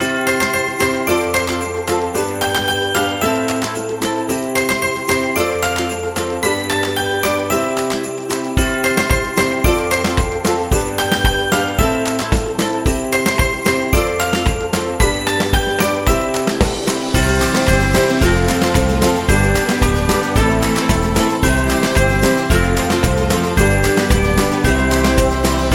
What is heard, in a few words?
Happy Christmas; xmas